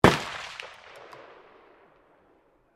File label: RIFLE MOSIN NAGANT 9130 FIRING DISTANT; Rifles, military; Rifles (Sound Effects:Guns)